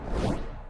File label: spawn, magic missile; entity